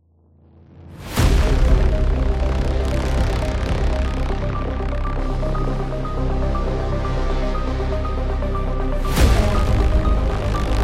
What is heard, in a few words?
Dramatic